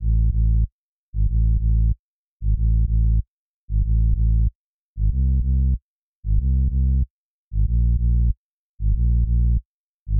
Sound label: Deep Bass